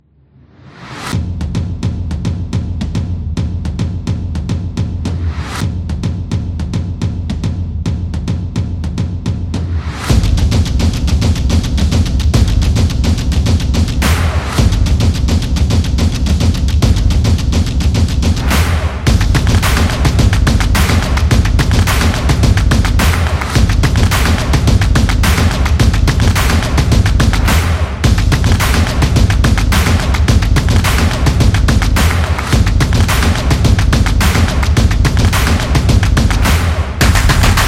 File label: Basketball, sport drums